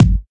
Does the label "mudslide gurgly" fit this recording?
No